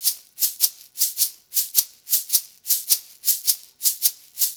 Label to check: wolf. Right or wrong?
Wrong